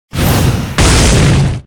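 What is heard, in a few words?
real undead golem boss attack, monster; thedead3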